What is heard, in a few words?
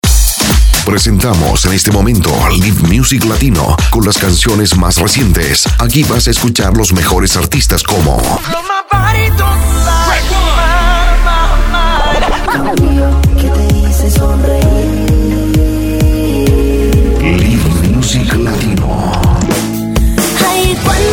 intro live music latino